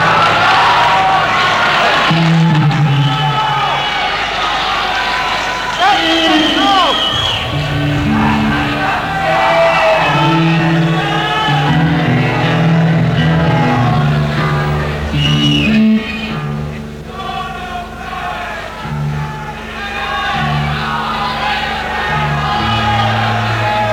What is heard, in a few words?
Tuning & Soundcheck